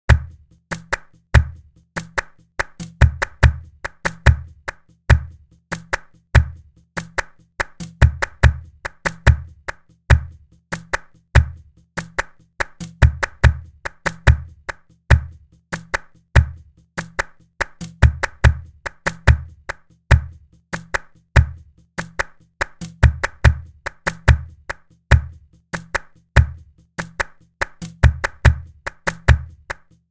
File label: Alegria, compas flamenco guitar